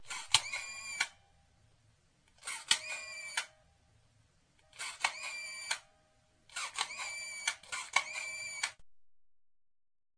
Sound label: camera